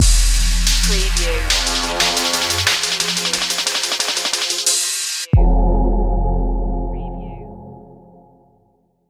underground bass stinger

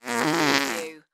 Fart Hard

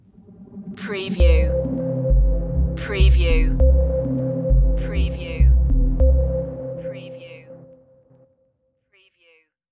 underwater pulse stinger

